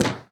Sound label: Rope Break; this is the zodiac speaking 2020 gamerip switch ps4 xbox one pc macos